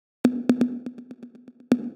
122 BPM Toms, percussion; percussion essentials 1

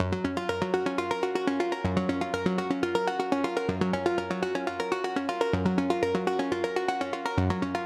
122 BPM Arps C maj A min Tonic F; Cycles of Motion; mmb studio melodic

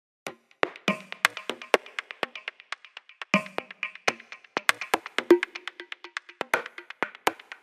122 BPM Congas; percussion essentials 1

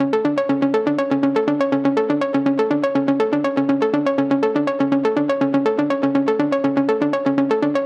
122 BPM Arps A maj F min Tonic C; arp essentials

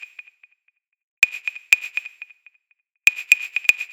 122 BPM Wood, drum; mmb drum arsenal vol 1

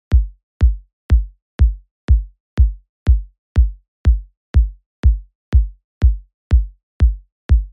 122 BPM Kicks Step 3; mmb drum arsenal vol 1

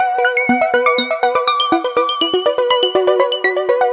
122 BPM Sequence Amaj Fmin Tonic B; innovative sequences

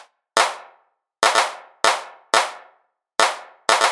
Claps, 122 BPM; mmb drum arsenal vol 1

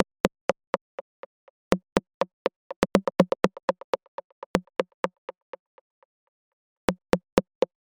122 BPM Rims; MMB; Percussion Essentials

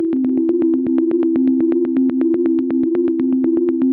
122 BPM Arps C maj A min Tonic E; arp essentials

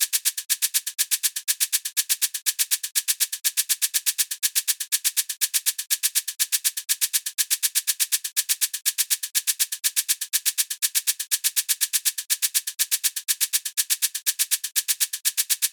122 BPM Shakes, percussion; percussion essentials 1

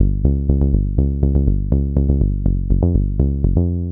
122 BPM Bass Synth D maj B min Tonic C; Pro Bass Synth Studio Kit; MMB

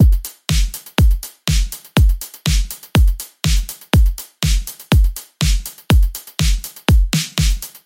122 BPM Battery Full Drum Forge; full drum kit

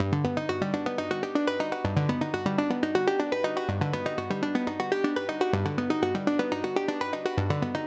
122 BPM Arps D maj B min Tonic G; arp essentials; Cycles of Motion

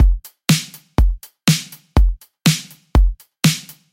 122 BPM Full Drum, full drum kit; Battery; Essentials Pro

